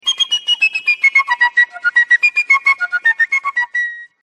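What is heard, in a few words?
piccolo; flautim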